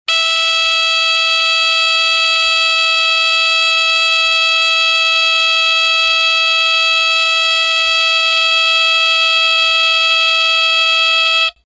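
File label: sound of alarm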